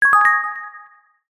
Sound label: multimedia notification mallet synth dreamy